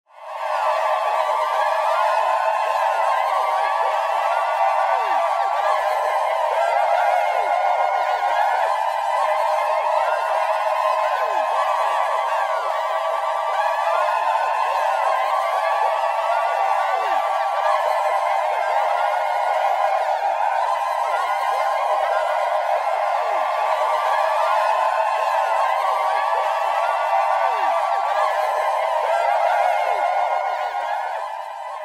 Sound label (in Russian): boevoj klich indejcev